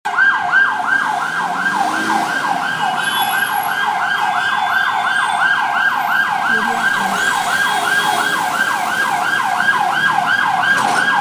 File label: ambulance siren